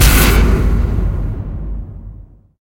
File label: Music